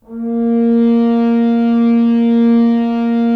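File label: Solo French Horn; Roland - LA Composer vol. 4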